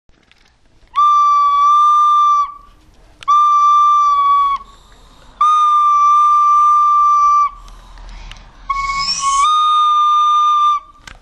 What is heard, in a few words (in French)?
flute